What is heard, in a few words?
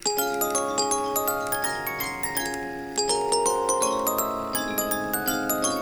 Boxy Jingle; Project Playtime